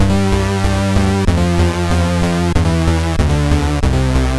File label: T-Rex 64, MOS6581R2